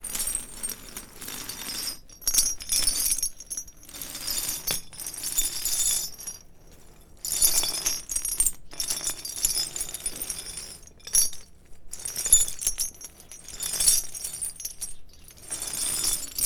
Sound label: Chains Chaines